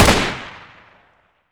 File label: sg550 fire; garrysmod